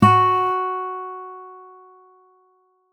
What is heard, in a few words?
ks pluck F sharp 4